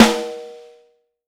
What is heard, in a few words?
Vintage Snare Drum